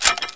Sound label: crossbow foley